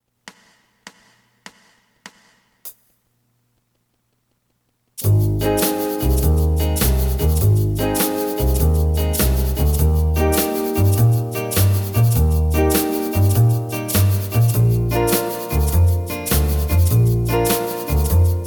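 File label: Asax 1st 2nd, alto saxophone 1st 2nd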